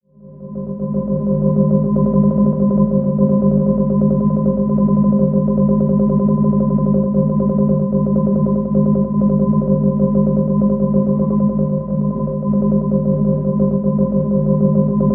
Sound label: Sci-fi Rumbling Ambience